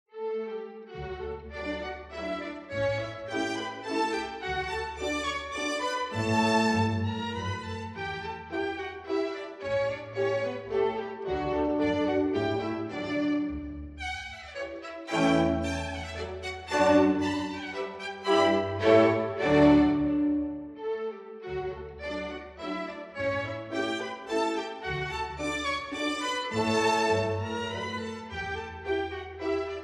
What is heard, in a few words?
Divertimento No. 17 in D Major, K. 334 III. Menuetto